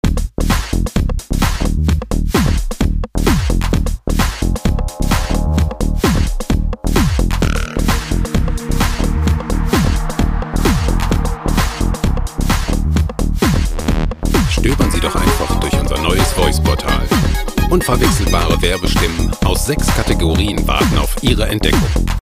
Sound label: tech